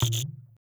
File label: UI error; truck